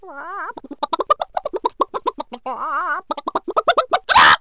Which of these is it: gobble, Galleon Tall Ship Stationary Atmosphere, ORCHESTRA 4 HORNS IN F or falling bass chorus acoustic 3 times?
gobble